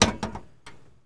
Truck Dodge Ram Hood Open; Trucks

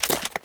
Gun Holster Weapon, Weapons Foley; SFX Kit

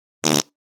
Fart Foley - Surprise - Short; Hollywood Edge; sound designer's toolkit v2

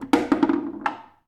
Foosball Goal Score

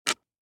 DICE on Hard Wood, Grab, Standard, 4 Four Dice; Samples; ArticulatedSounds; DICE Sfx Party (Extended)

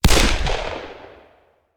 Hand Gun; Sound Ideas; Guns

